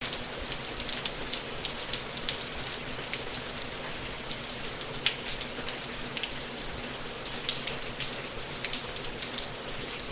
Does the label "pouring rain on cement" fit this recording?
Yes